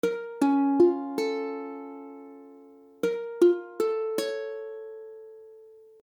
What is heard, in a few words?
Bbmmaj7, akordy ukulele